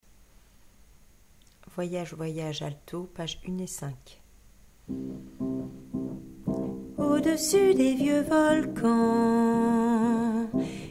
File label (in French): voyage alto